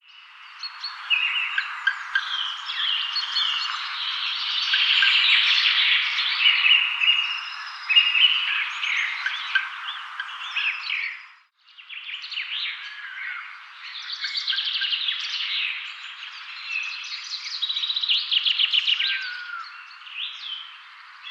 Garrulus glandarius; SETE

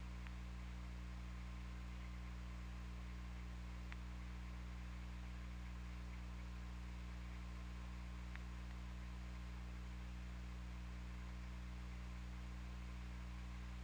Orca; OrcaLab

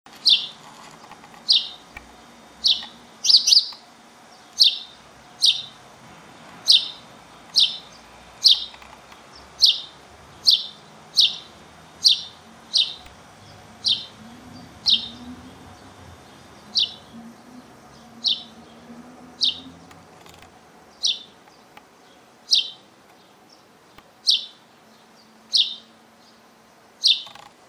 Passer domesticus; SETE

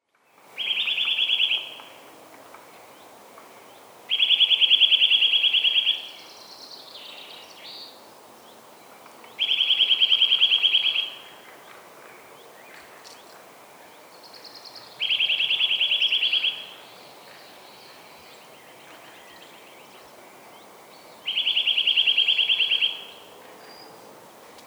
Sitta europaea; SETE

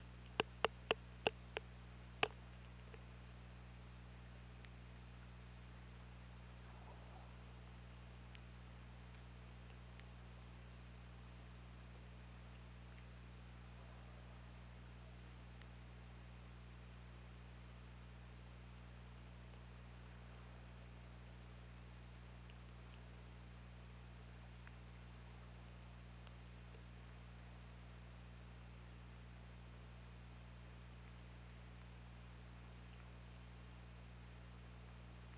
Orca; OrcaLab

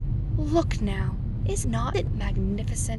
noisy

